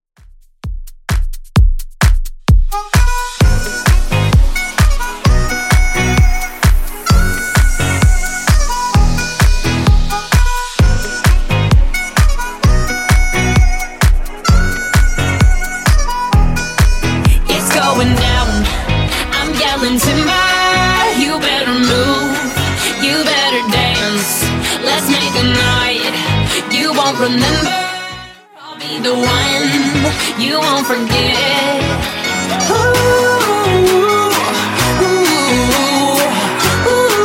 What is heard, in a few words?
Top40, 130bpm